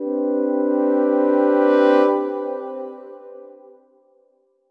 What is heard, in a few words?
orbit horns; Sky Children of the Light gamerip